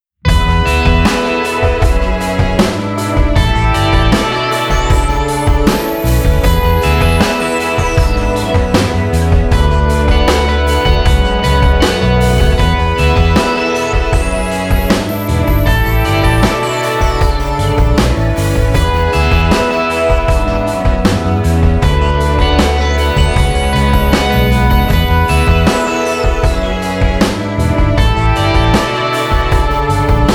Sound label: Pop